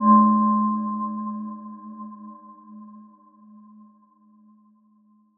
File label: Aurora B3 f; healing soundscapes; HSS-Custom-1